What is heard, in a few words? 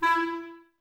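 clarinet, Woodwinds; Sample CDs; Miroslav Vitous; Woodwind Brass Ensemble; Banks W&B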